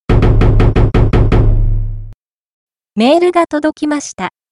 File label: ring mail